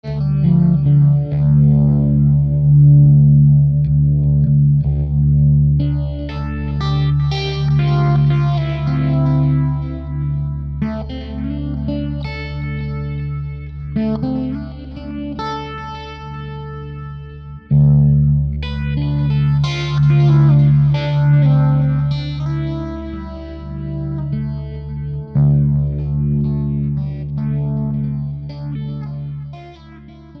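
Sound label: Musical Element, Drum Snare March; Blastwave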